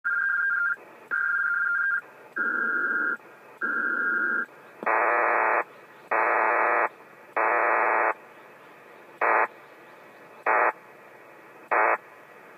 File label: PACTOR IV audio